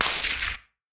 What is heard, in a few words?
whiz super; whiz super 01; midnight guns